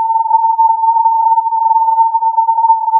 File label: filtered input